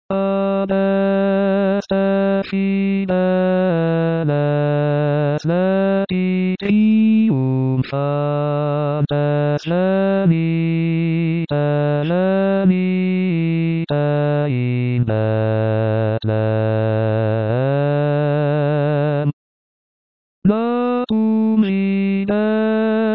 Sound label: Basse; adeste fideles